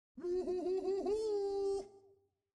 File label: ouh ouh ouh; esquie